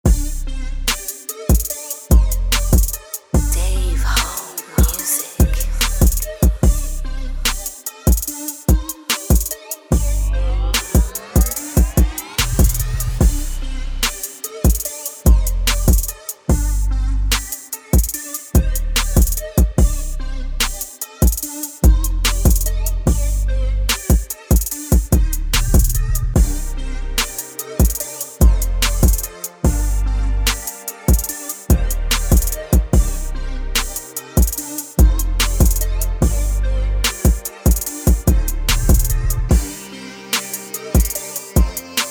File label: Instrumental